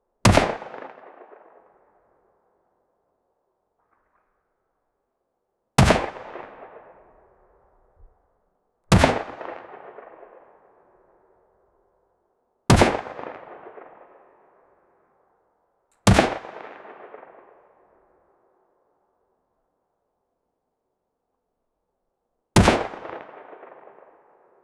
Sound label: echoing pistol fire